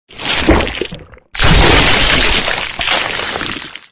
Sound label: human flood morph arrival